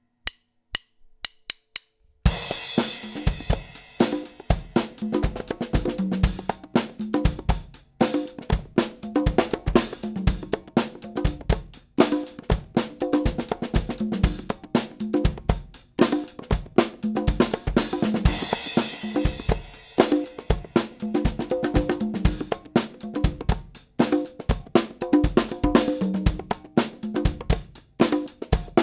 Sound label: Funk 120bpm, Percussion